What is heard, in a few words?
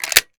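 charging handle back, weapons m1014; arccw ud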